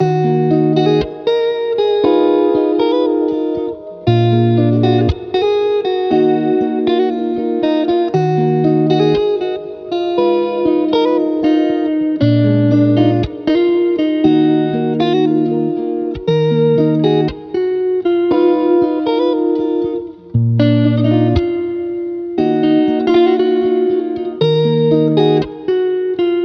118 BPM D# Min, Guitar Loops; Stories; Cymatics; Journey Guitar Loops

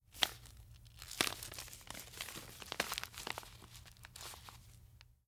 Walking in Branches; Fairlight Sound Lib

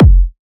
Kick, Techno, One-Shots, Drums; Ghosthack; Techno Sounds 2025

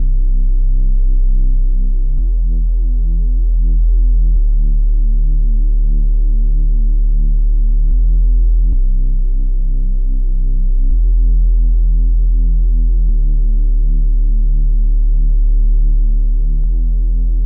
Bass, 110 BPM E Min; Cymatics

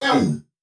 Processed Chant - "Ew"; Cymatics; Euphoria Vocal Sample Pack

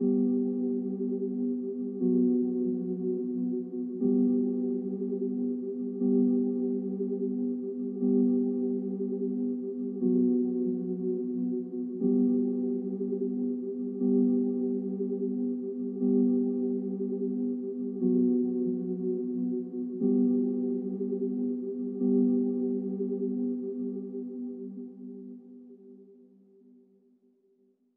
120 BPM E Min Pad; Melody Loops; Loop Stems; Hip Hop; Cymatics; Hibiki

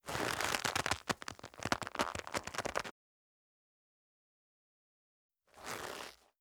Rope Creak Stress Leather; Fairlight Sound Lib

